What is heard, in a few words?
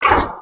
Run, creatures organic